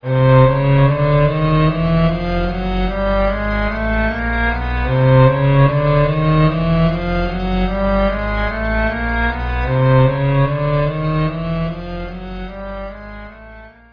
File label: shepard slow